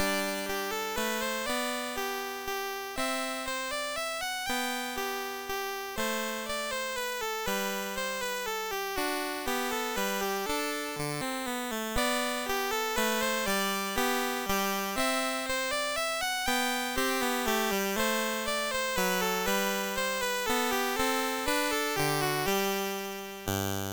Minuet, MOS6581R3

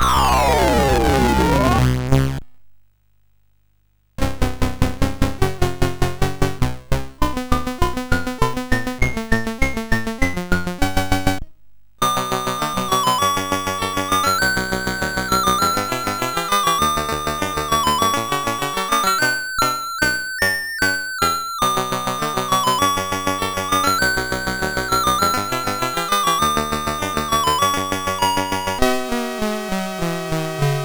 Music, MOS6581R4; Colleen Music Creator

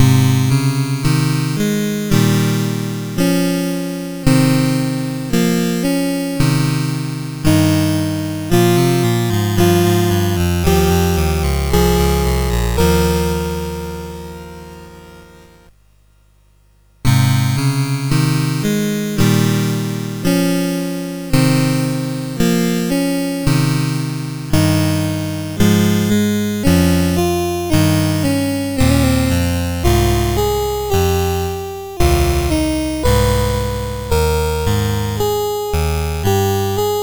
Keyboard Piece A Flat, CSG8580R5

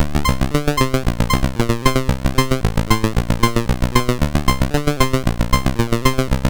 sid, MOS6581R4; Classic Concentration

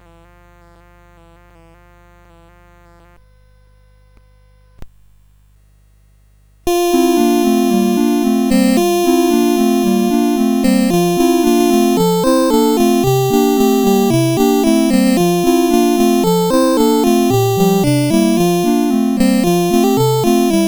CSG8580; CSG8580R5

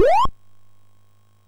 sid MOS6581R4

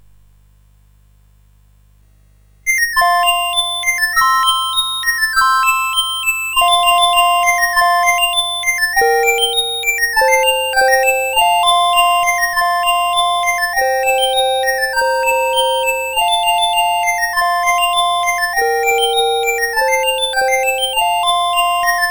Dancing Bells, CSG8580; Syl

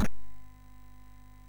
sid MOS6581R4; Passing Shot

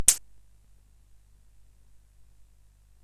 sid CSG8580R5; California Games

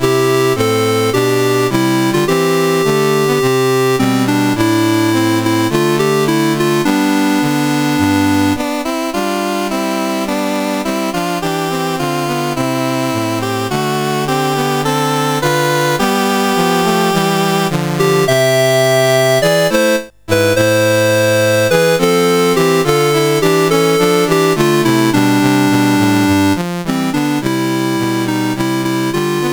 CSG8580R5, National Anthems; Mauritius

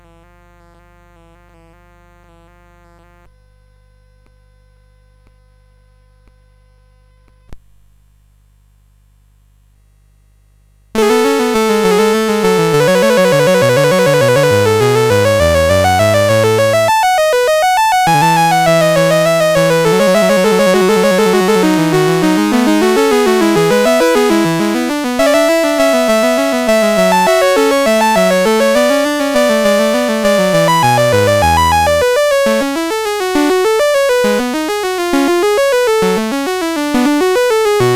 Two Part Invention, CSG8580; CSG8580R5

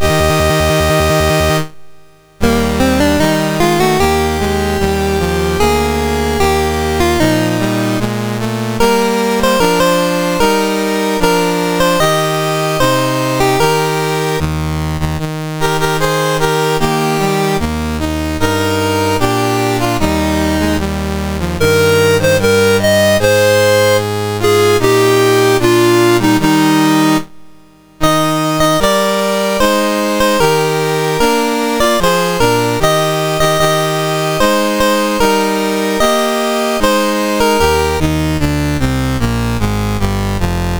MOS6581R2, National Anthems; Sudan

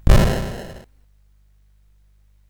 sid CSG8580R5

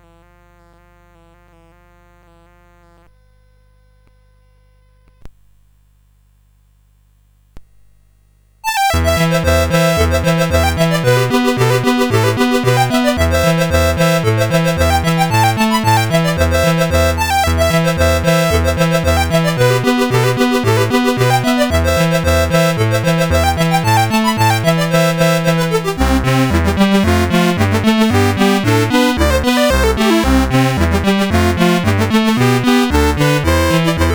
CSG8580; CSG8580R5

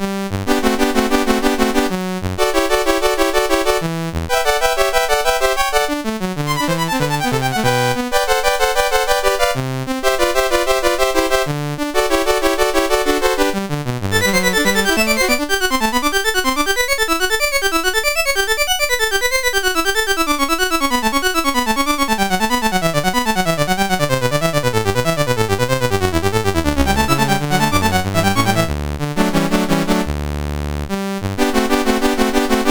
Variation, MOS6581R4; Variation 9; Goldberg Variations

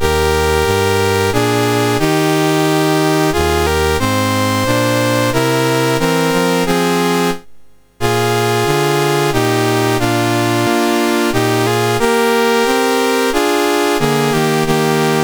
Austria National Anthems, MOS6581R4

